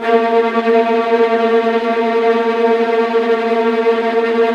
VIOLINT, Strings; Synclavier; ILIO

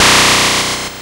Atari Noise Long, Atari 2600

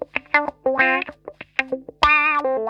SHRILL WAH, Funk Guitar; Zero G - Funk Guitar

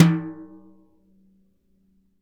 TIMB BR HI H, Synclavier Percussion; Synclavier Percussion 2; World & Orchestral; ILIO

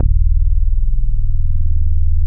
SH 101 BASS

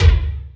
Sample, Roland MV8800, BREAKWILDKIT

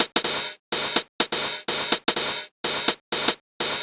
drum loop triple hat 125, Classic Chicago House; Sample Magic; Drum Loops

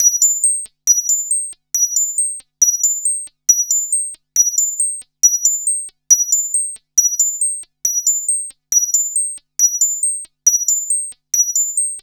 Seq, analog sequences; Dream Zone

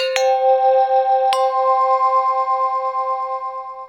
BELL NO ECHO; AMG - Vince Clarke Lucky Bastard

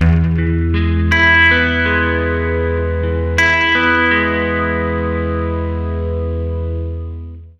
80 MAJ ARP E, Acoustic Guitar Loops; USB Soundscan vol.04 - Electric & Acoustic Guitar Loops; AKAI

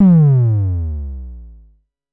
DSM2 Sub; MPC DSM-2

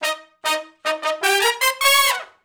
Shuffle Blues Riff (Eb) unison, Horncraft for R&B; Sections

